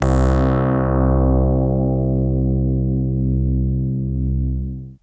Modular Hammer Vinyl Synths C1; vinyl keys

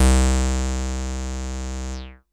SYNTH

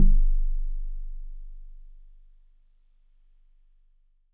Celeste DX100 G-1; Pristine Celeste

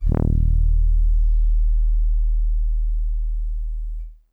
Soft Horns DX100 Ds1

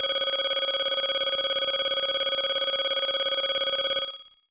Alarm Call DX100 A5

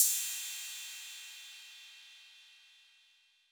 Cymbal 808 Decay, Clean Digital

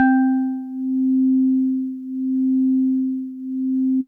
House Organ DX100 C4

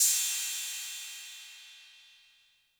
Cym B 808 Tape Decay B; Cymbal; Clean

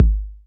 BD 808 Mid Color

